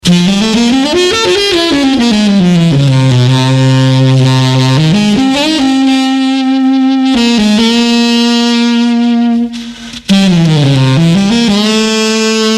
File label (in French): sax, un son de synthetiseur; les deux sons pitchés sont delayé (avec des slaps d'une centaines de msec)